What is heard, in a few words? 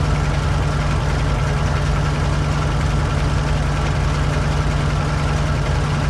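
zero cockpit right, engines; War Thunder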